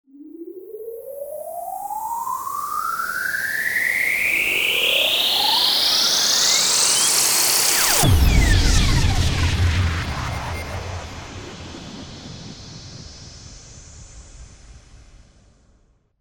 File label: QUASAR BURST RISE IMPACT